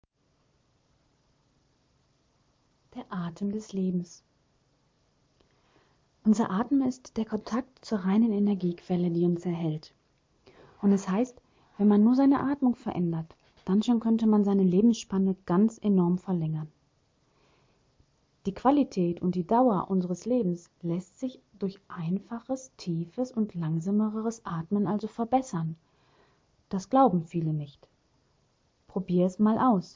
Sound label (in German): Rhytmisches Atmen